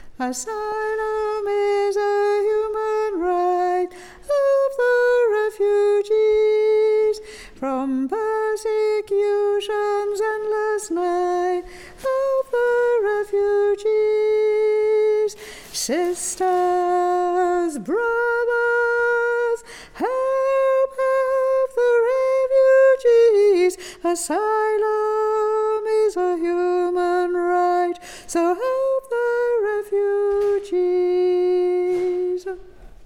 Asylum Is A human Right Ten

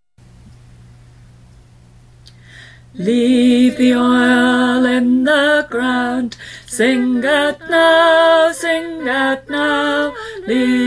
Tenor

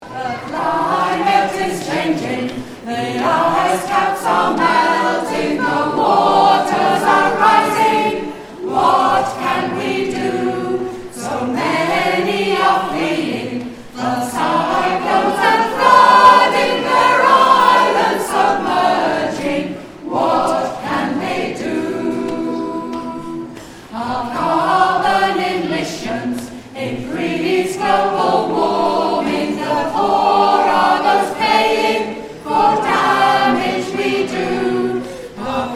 green song

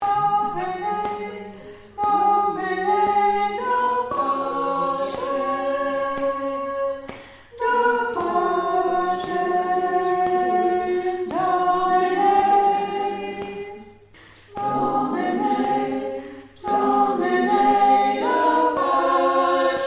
Tenor

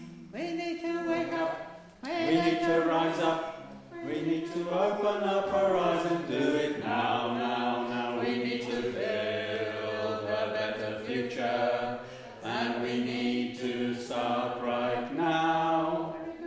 Bass

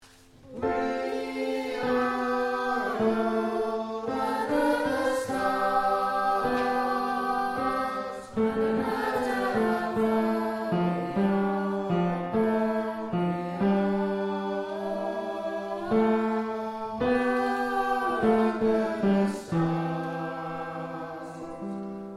2nd Bass

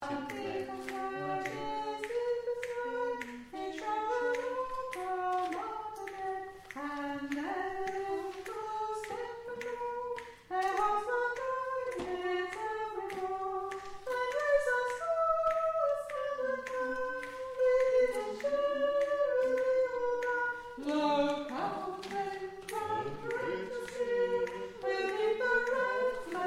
rhythm

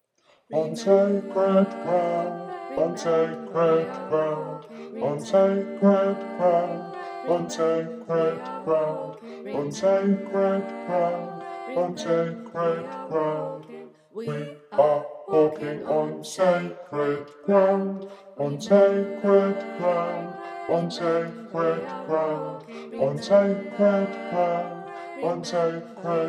Sacred Ground Bass; Extinction Rebellion

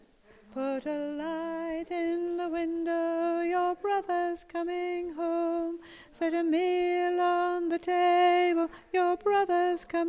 Verse Harmony

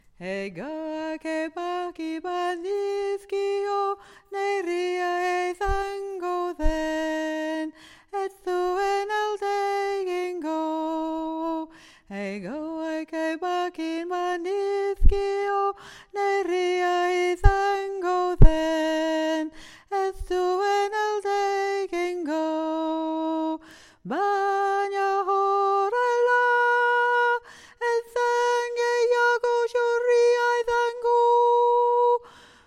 tenor

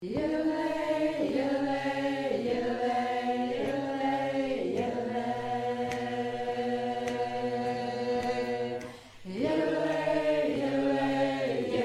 yillolay Round

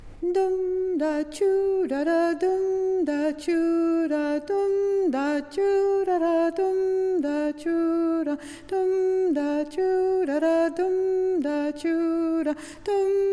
alto

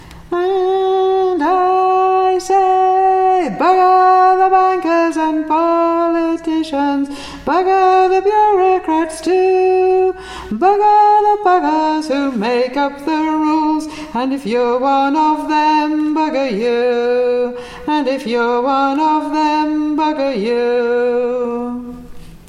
Alto Chorus

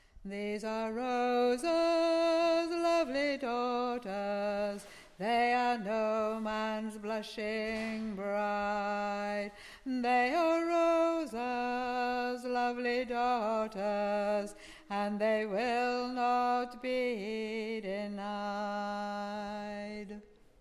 Chorus Tenor

